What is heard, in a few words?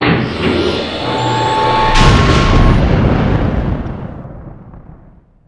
ship slipspace end